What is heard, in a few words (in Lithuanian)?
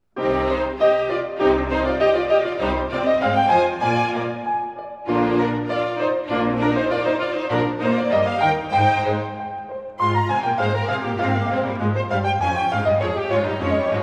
Koncertas dviems fortepijonams; Bach; BWV 1060